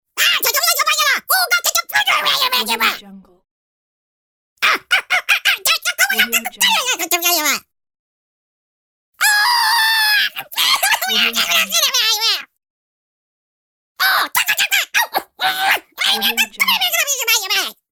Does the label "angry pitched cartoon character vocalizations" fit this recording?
Yes